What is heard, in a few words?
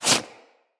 Quick Foley; Characters